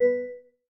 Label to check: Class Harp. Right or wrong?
Right